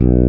FRETLSSB; Analogon

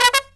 TRUMP, Killer Brass Riffs; USB Soundscan vol.29 - Killer Brass Riffs; AKAI

